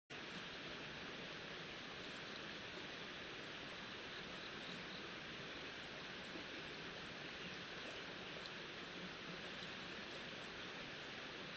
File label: riverside; Kyoto U; Ashiu